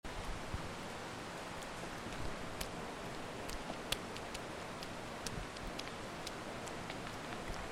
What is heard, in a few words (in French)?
AMB RUISSEAU